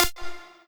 lead

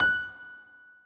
Key F#7

